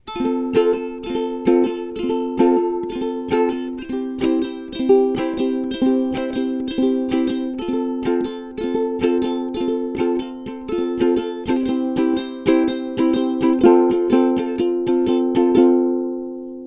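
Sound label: Ukulele riff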